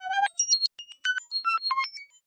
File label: Arpegg trickle chiptone